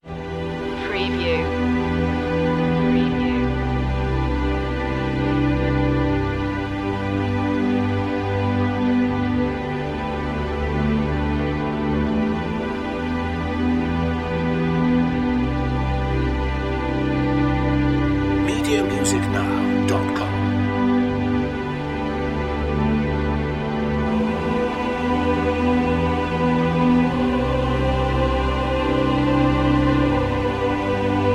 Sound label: strings choir only